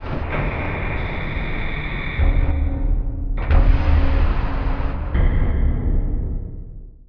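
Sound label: silo close; MACHINES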